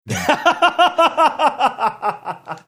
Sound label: awesome laugh